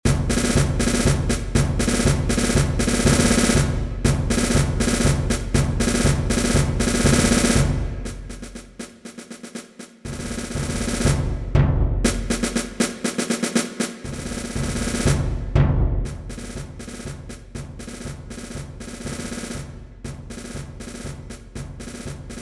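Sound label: Battalion Beat, bugle band; london band drill competition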